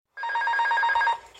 Airbus Alarm

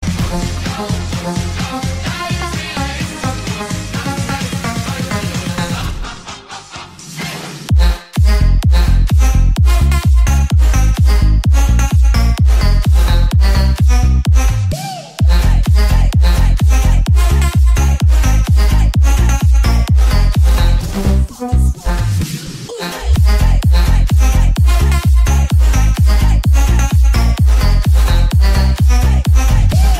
hard bass

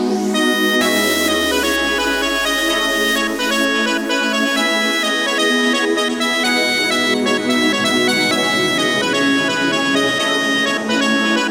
Cool Music

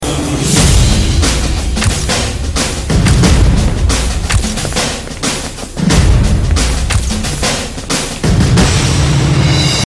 Saj Drums

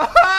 Perfectly Cut Scream

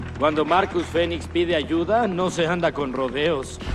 dell gears

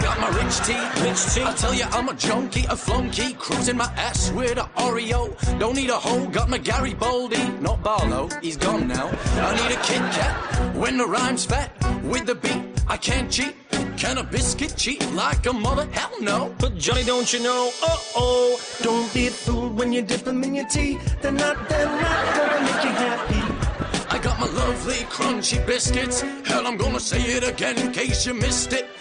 Rap

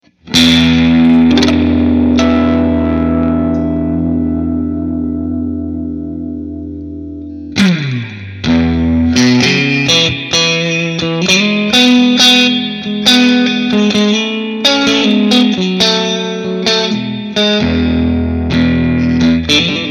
Noctural Guitar

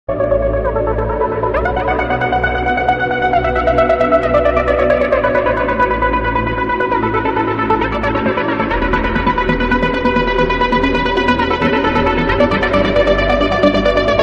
Trance

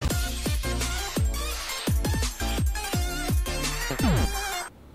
Missa Sinfonia intro

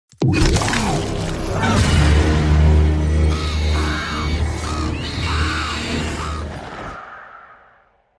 Fiddle

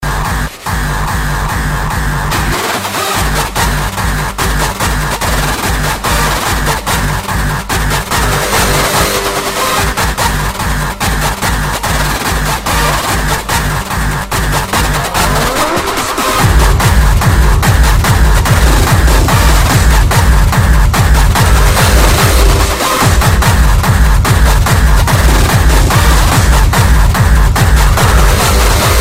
Hardstyle; Mafia

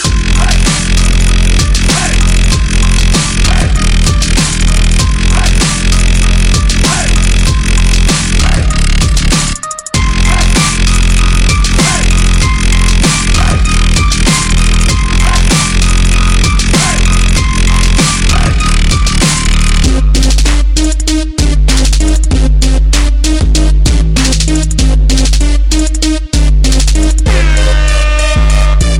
Bass Stomp

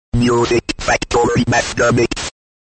Music Mix; Music Factory

